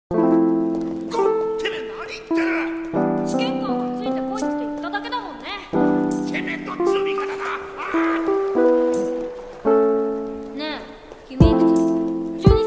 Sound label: lofi; Hunter x Hunter